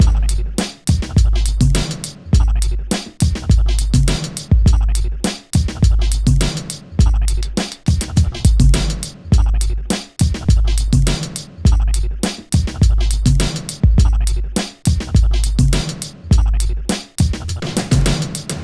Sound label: Beat, Drums & Percussion; Electrocution; Beats